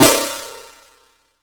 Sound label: SNR, Drums; Polyend; Simulacrum Sounds; 1 Shots